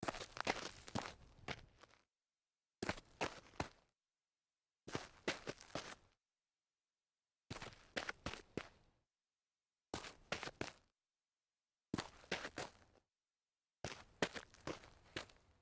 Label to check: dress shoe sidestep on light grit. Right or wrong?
Right